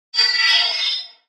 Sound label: sphere socket detach; Source Engine; Portal 2